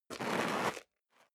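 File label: step sneaker concrete grit scuff, footsteps; schritte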